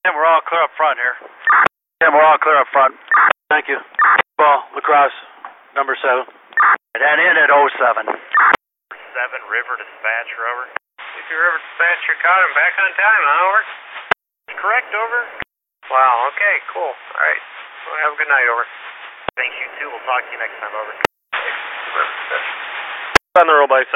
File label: Amtrak